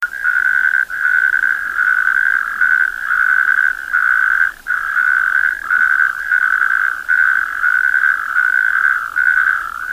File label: natterjack toad epidalea calamita; lyagushek i zhab